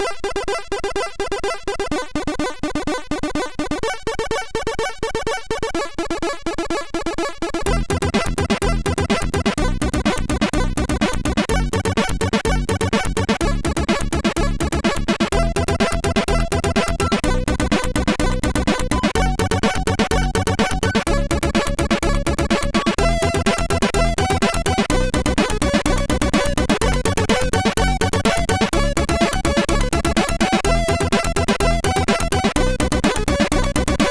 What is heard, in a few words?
Hard Bass, MOS6581R4